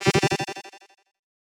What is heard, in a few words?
Delay Allert; FREE SFX PACK VOL 3